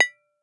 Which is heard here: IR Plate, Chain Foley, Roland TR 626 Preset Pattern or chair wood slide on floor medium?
IR Plate